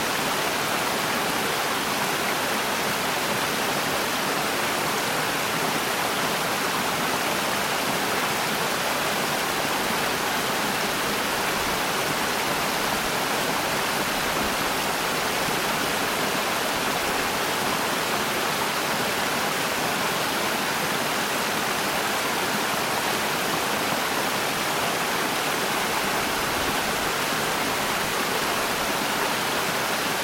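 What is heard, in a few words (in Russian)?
прекрасный ревущий водопад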